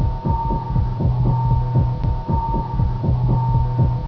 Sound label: tune